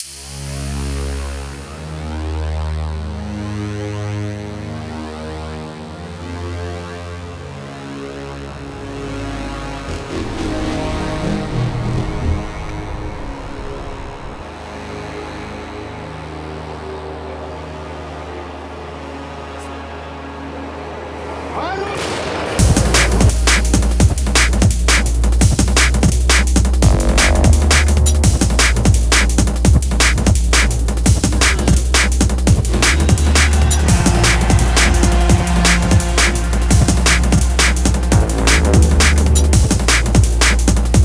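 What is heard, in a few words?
Chill Beat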